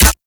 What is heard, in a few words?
Mech, Weapons; Warframe; Infested; Cernos